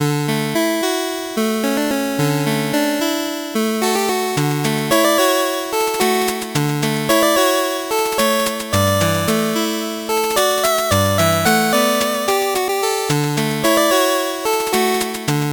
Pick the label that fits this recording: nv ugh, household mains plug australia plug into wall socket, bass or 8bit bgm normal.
8bit bgm normal